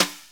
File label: Snares, Rimshot